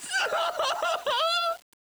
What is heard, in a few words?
pops